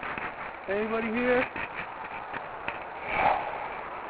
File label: big sigh; oly dig